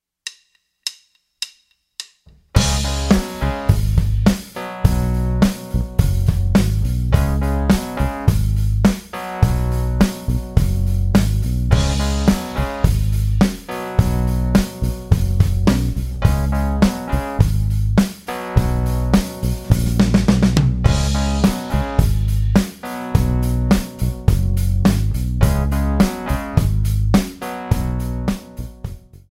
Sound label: 105BPM Cm